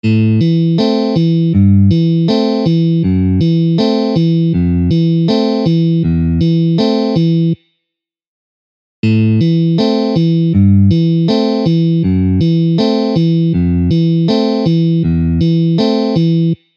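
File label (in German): A-Moll über wechselnde Basstöne; pfadfinden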